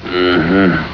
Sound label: mmhmm